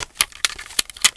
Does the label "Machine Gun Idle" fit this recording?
Yes